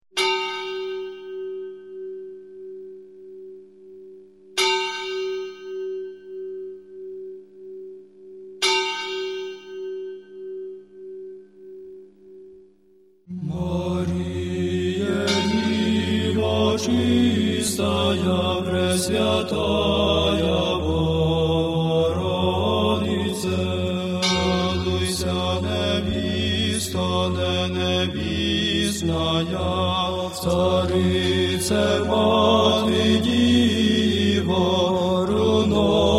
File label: UKRAINIAN; UKRAINE